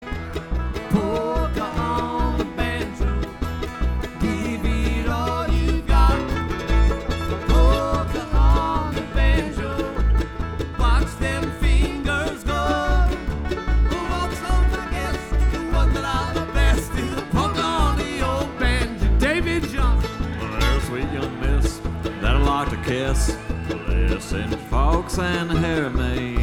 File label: Polka On The Banjo